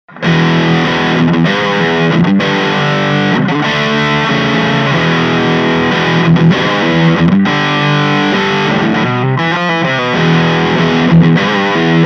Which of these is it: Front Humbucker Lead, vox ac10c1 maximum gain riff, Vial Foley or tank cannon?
vox ac10c1 maximum gain riff